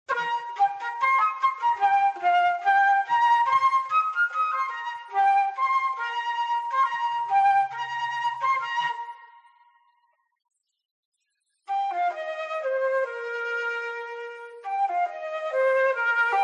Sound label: Flute Addenda